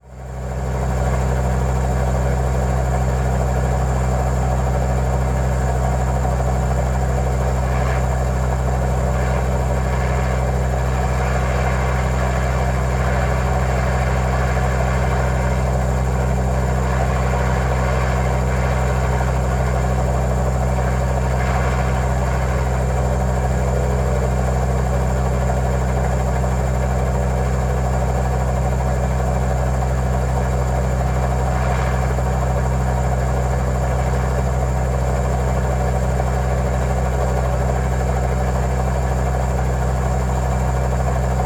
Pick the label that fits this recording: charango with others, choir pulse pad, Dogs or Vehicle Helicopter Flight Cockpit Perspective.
Vehicle Helicopter Flight Cockpit Perspective